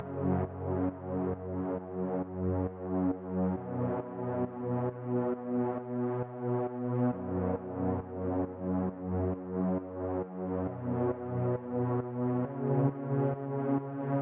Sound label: 135BPM Softpad, F# minor; Melody & Synth